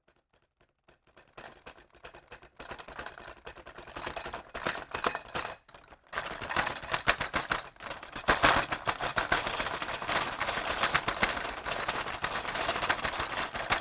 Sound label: household cutlery tray shake rattle earthquake